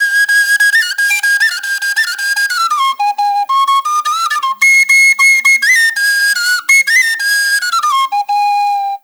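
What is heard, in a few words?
PENNY WHISTLE; Spectrasonics; Heart of Africa 2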